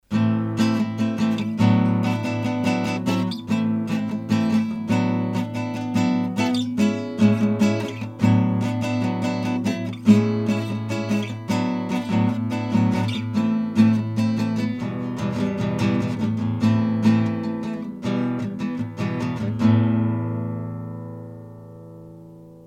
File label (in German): 20 cm Kugel mit Pop Gitarre; Behringer C 3